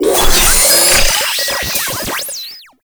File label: Practice Orb Activate B; Warframe; The Teacher; Mod Quest